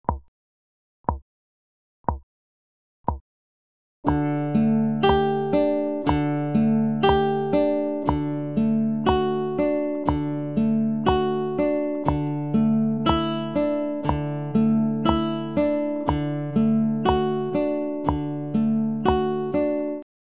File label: Picking, Acoustic Pop Guitar; Picking Nr. 4